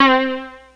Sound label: C3 syn tar